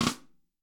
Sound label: Drum Snare Dragging sound